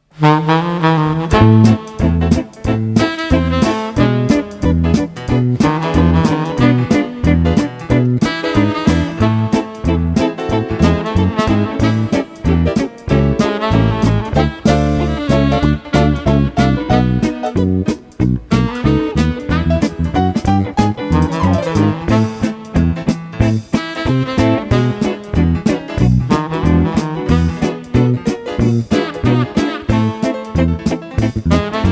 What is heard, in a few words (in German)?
Saxophon Foxtrott